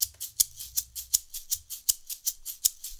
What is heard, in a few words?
080 shaker; Zero-G; Monster Beats